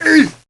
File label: Enchanter Hurt Sound; Heroes3